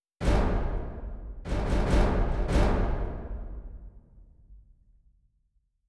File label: orchestra hits; Netease; gank